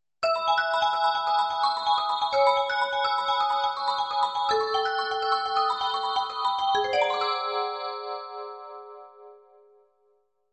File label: train bell